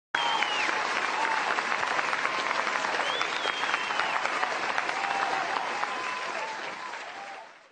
crowd luagh

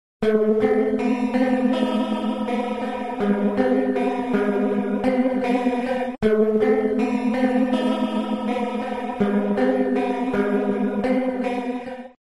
Stereo Flanger Vibrate Flange Guitar Wet; Sonicircuit; Syntheway

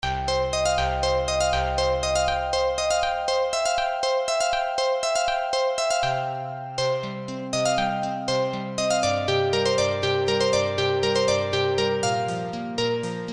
Electric Harp; Chordophonet; Syntheway

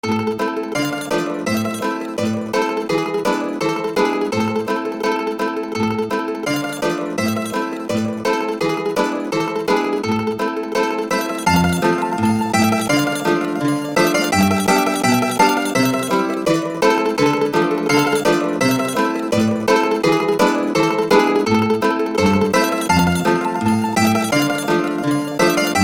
Mandolin Tremolo; Banjodoline; Syntheway

